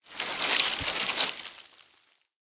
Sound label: tree untwist; tanks; War Thunder